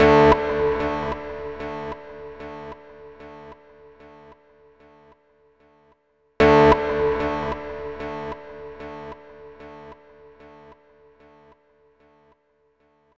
64 OUT GTR, Dark Side Of TripHop

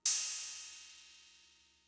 Cymbal, Fricke MFB-522, Drum Machines

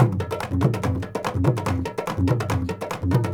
INDIA L; Spectrasonics - Heart of Asia CD2

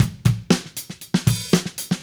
Drums, 70s Analog Funk; Sonic Foundry (Sony Creative Software); What it Is!; Good Times

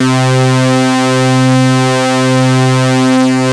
MOOG BASS C4; Best Service Dream Experience

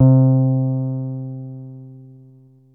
Bass Synths hits and octaves

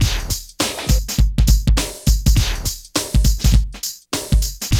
Loop, Roland; Sound & Vision - Gigapack I

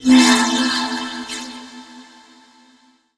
VOICE FX, Dance Vocals

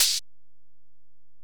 HiHat, Alesis DM-PRO

